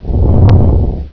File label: rumble